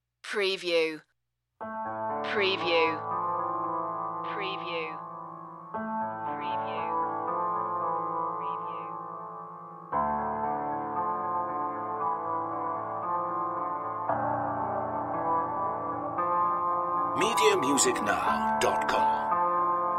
piano only

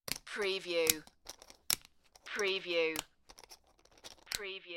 Poker Chips Collecting from Table

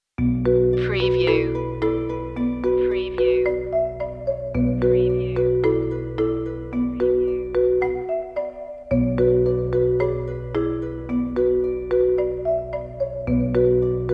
no metal perc

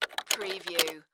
Computer Keyboard

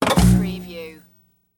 TV Turn On

